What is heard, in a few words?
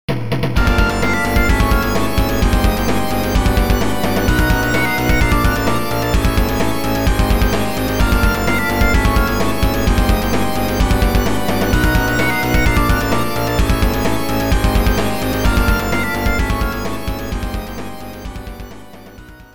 stage 5 boss; the hammer; balbars